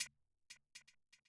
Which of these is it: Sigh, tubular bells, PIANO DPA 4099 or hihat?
hihat